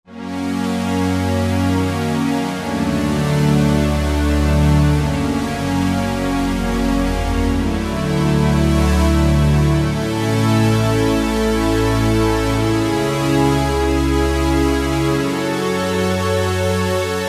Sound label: CS Saw Pad, vintage synth; Roland SR-JV80-04